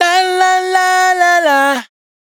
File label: riff La La La Bbm, Dry Vocals; Raw Vocals; Slate Digital Omnivox Vocal Sample Pack